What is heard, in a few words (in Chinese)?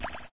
水花音效